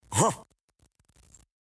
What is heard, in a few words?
ugghh